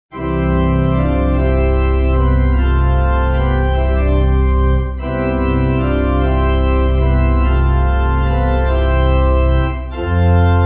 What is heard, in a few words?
Where He Leads Pipe